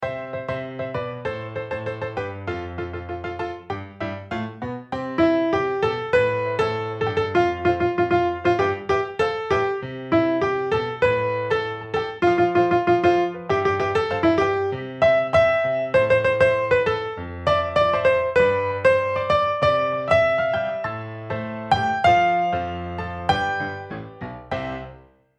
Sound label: PLUNK